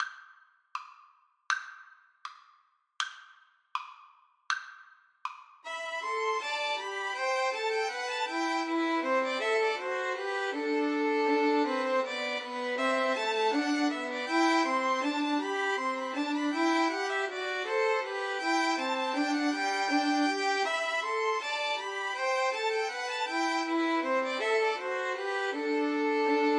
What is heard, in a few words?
bourree, Violin Trio